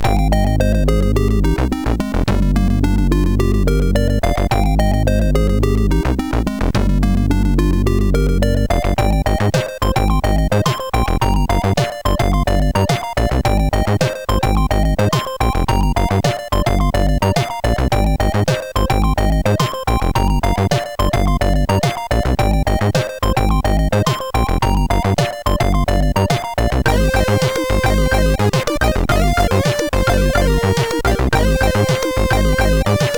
Intro Bells, sid MOS6581R4; hvsc

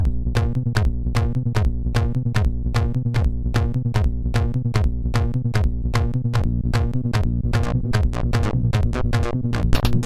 Loop, sid MOS6581

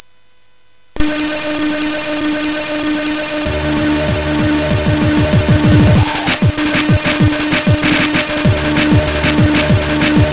Low Energy, sid CSG8580R5; hvsc

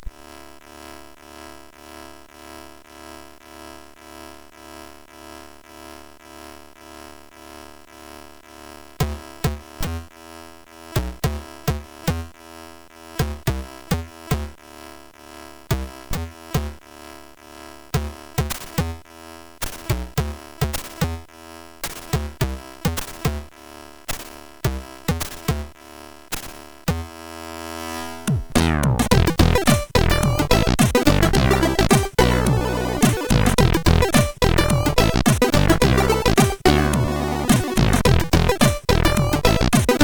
Groove sid CSG8580R5; hvsc

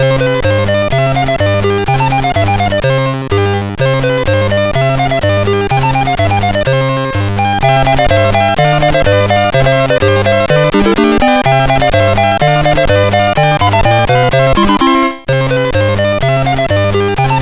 C64 version, CSG8580R5

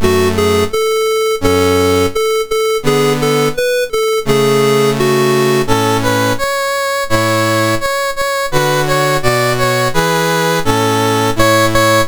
National Anthems, MOS6581R2; Slovakia; Dave Marquis

